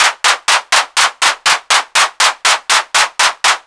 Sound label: clap, raw